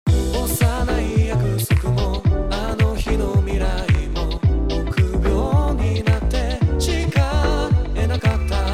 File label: Vocal Wet